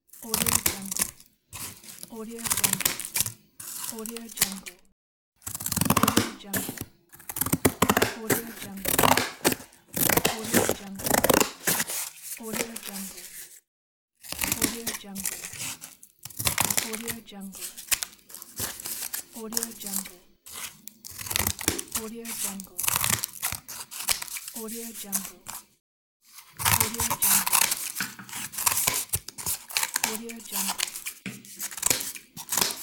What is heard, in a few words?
cutting cardboard with scissors